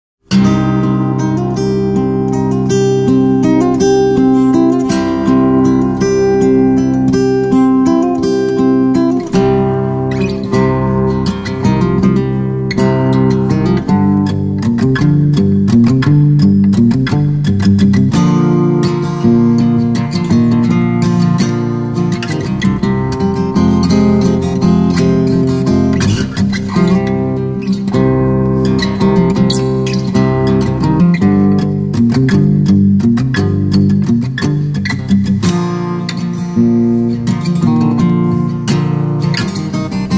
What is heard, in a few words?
Guitar version